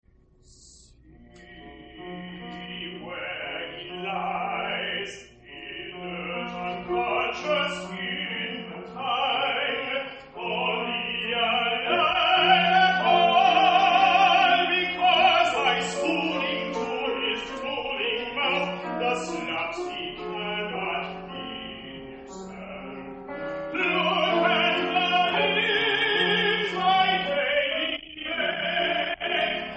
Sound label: tenor aria